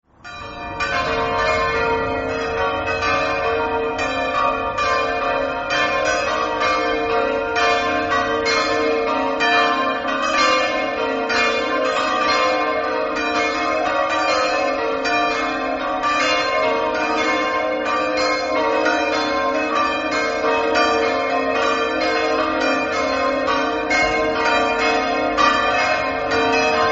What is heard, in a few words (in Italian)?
cormons, campane